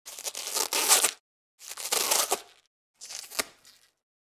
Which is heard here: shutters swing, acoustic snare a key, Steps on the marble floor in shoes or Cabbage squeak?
Cabbage squeak